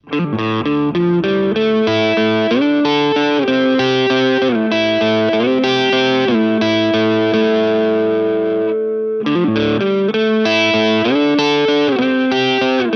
Music Man Mariposa both

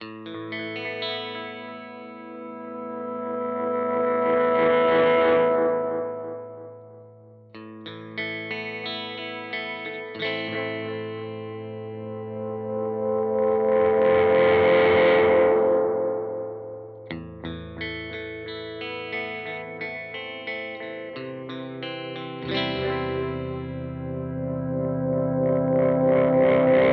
Seymour Duncan Vapor Trail Oszillation

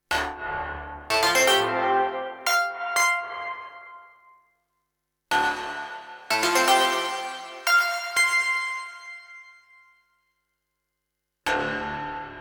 modal; logidy epsi

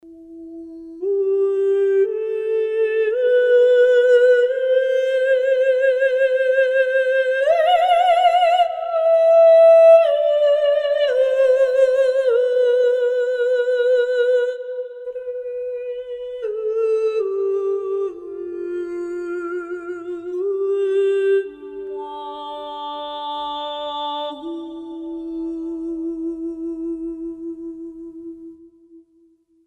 ethereal female solo voice

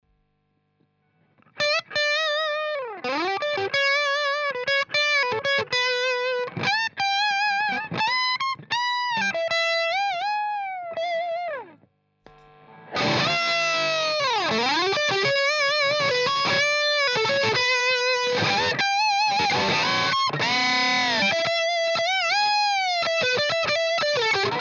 Screamer on off Lead; JHS

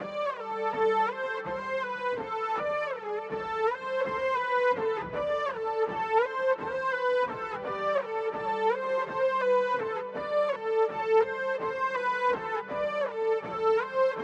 Boss SY 1000

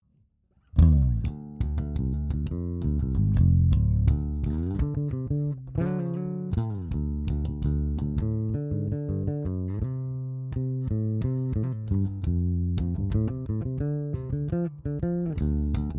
DI Flying V

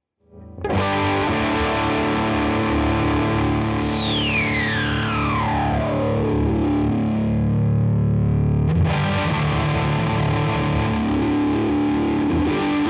TARS Cutoff

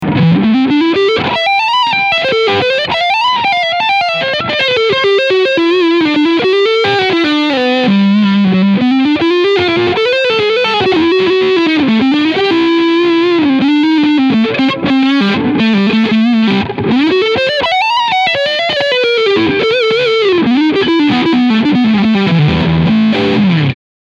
Leadsound Front PU

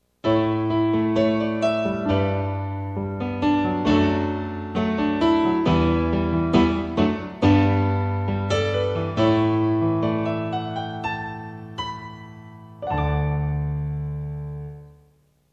Piano

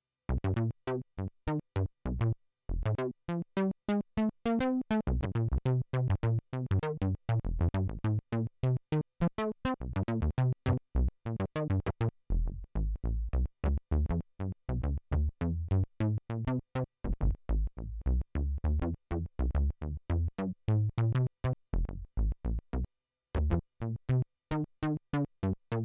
cameleon bass; parva

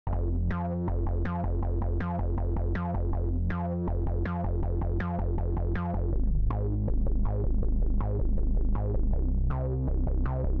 Phat Phunk LDS FX Normal no Lim; TB Lowtone; 16 dBFS

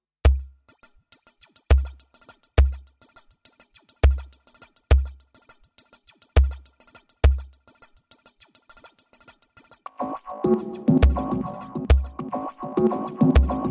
Loop

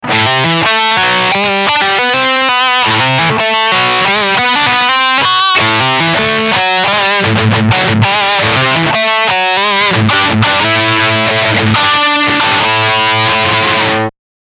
Shawbucker Riff

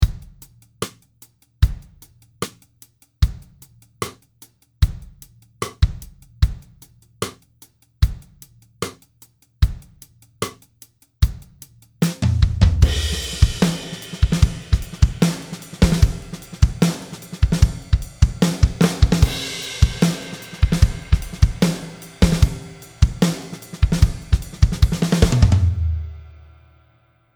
Lexicon Ambience Snare Reverb

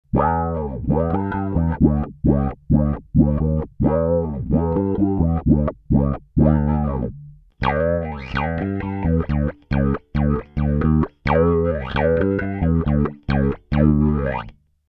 Indie Wahwah

